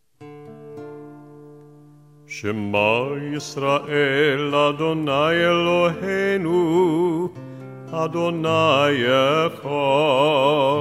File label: Shema sung